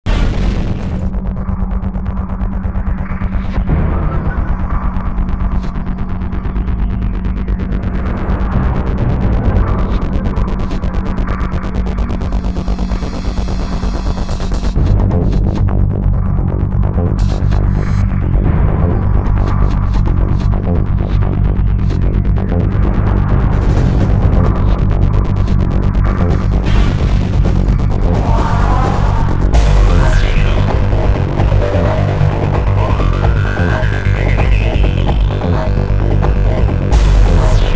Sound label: Rocktronica; Quake 3